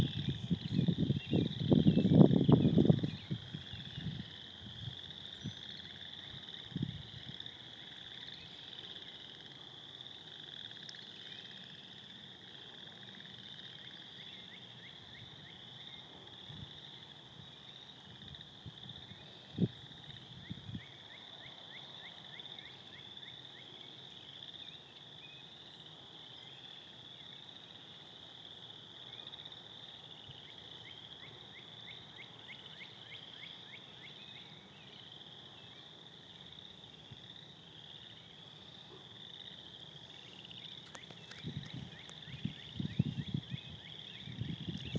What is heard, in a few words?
Sounds of Moraine Hills